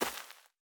垂根踩踏声

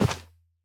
骆驼沙地脚步声

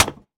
泥砖 破坏声

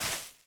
可疑的沙子放置声

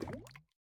滴水石锥熔岩滴落声

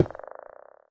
磁石放置声

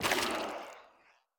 幽匿尖啸体破坏声

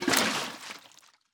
鱼桶倒出声

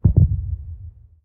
监守者心跳声

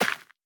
缠根泥土破坏声

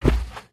北极熊脚步声

